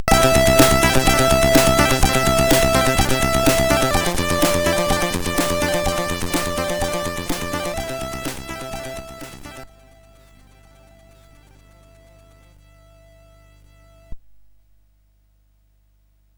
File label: Hires Demo Kit, CSG8580R5; hvsc